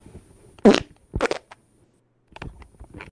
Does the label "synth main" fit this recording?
No